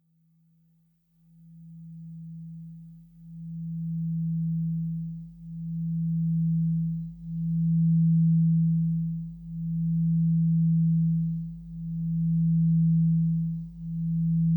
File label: singing bowls; Yoga and sound